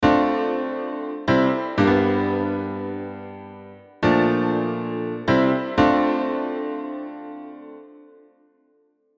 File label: Chords